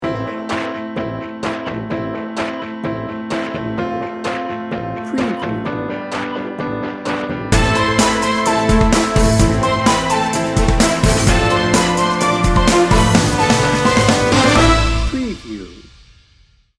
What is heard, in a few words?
adventure junkie